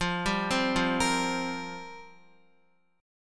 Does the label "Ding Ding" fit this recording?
No